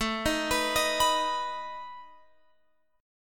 A Suspended 2nd Flat 5th, Guitar, Open F; Asus2b5; arpeggiated; chordshape

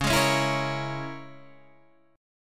E 6th Flat 5th C sharp

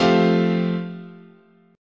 F Major Add 9th, chord

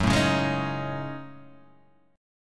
F sharp Minor 6th Add 9th, Guitar, Half Step Down

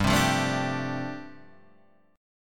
G 7th Suspended 2nd Suspended 4th, G, chord; x,x,5,5,5,4,4,4; down

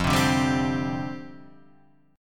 F 6th Suspended 2nd F; F6sus2 strummed

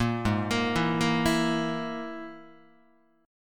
G sharp Suspended 2nd Sharp 5th, Guitar; G#sus2#5; arpeggiated; JGuitar

